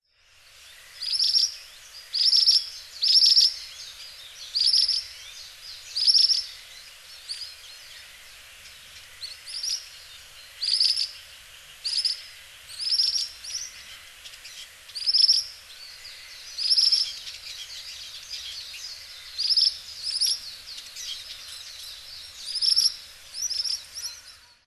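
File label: Ashy Minivet; hyogo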